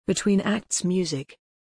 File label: between acts music